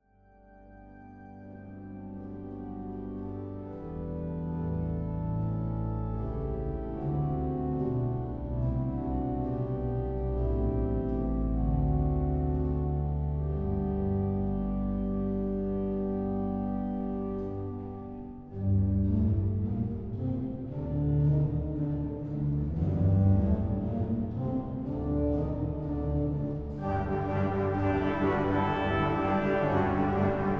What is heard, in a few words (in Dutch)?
improvisatie over het wilhelmus; door de nacht van strijd en zorgen; Martin Mans Bavo